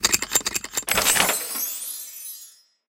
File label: UI Map Unlock; BTD6